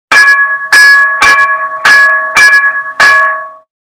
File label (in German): Stationsglocke